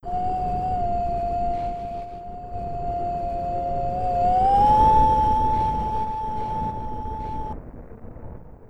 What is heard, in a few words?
moan